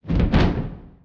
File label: wing flap; dogma